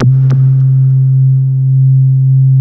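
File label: wall moog, bass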